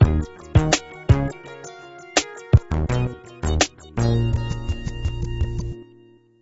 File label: Skunk sting; Gutterball 3